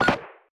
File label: select alt; iw8; gmod; viper